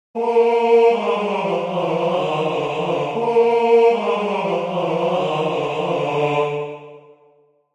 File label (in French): basse; Verdi Libiamo ne'lieti calici